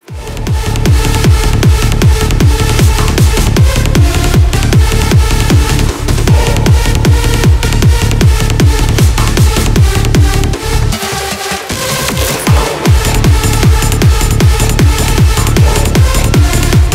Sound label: jingle bells remix